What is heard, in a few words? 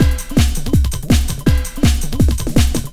drum loop